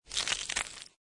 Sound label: Snow And Ice Ice Subtle Movement; also great as a bone breaking, bone cracking sound; Weather